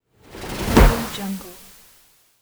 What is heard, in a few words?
rattle sweep impact transition